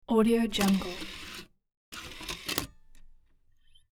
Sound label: cd rom quick open and close; hollywoodedge